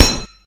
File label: Plastic Metal; opensim; CollisionSoundsAssetSet